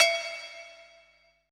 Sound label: COWBELL E, PERC; BPC PERC